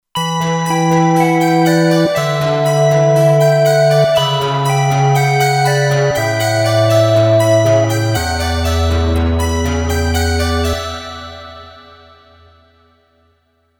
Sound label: Cembellophone, Kurzweil K2500X